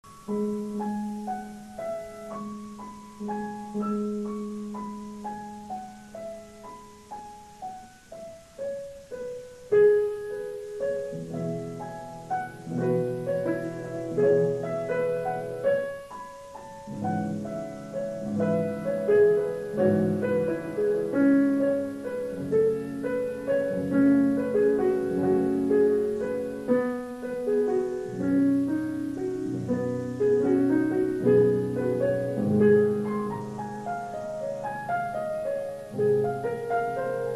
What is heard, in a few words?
piano sonata